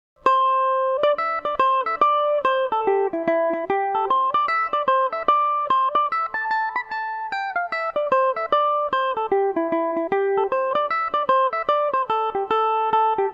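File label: A minor, irish mandolin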